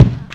Quirky Kick; EMU MoPhatt

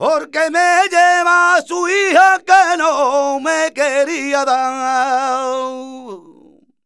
voc c, Flamenco Vocals Male Cantaor

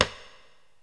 STEREO DRUMS

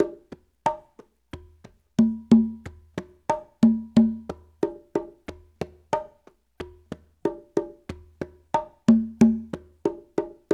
93, Latin Congas; Spectrasonics; Supreme Beats

